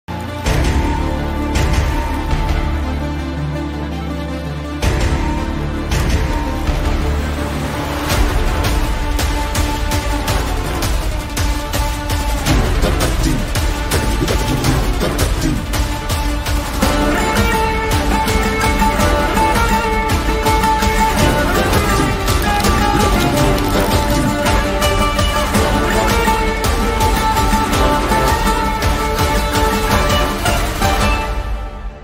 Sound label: bgm ringtone background music; sardar; karthi